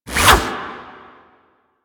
Baton Whoosh; Warframe; Lasrian; Lasrian Soldiers; Weapons

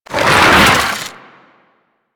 Moa Satyr Legs Roll; Warframe; Amalgam; Abilities

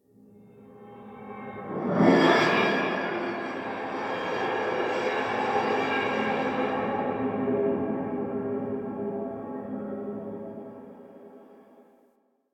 Metallic Drone

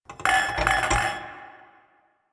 Glasses Clink; Ambience; Warframe; The New War; Quests; Zariman

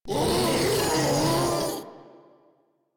Vocal Attack; Warframe; Proto Infested Ancient

